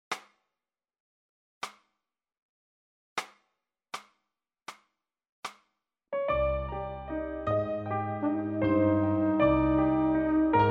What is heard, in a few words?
Cm piano play referencia trumpet